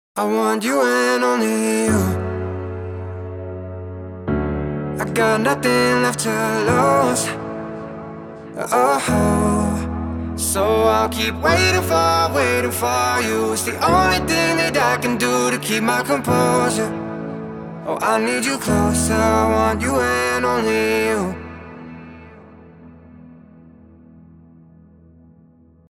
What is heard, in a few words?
main low